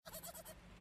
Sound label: psycho laugh